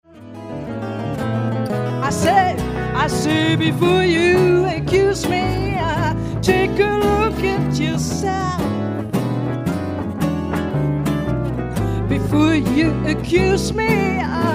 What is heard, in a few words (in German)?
jazz quarett hoerprobe, blues jazz band